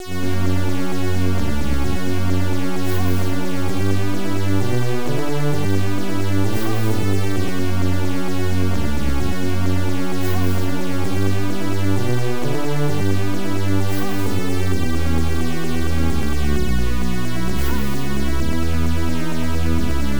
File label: Buy, Sell Music; Tyrian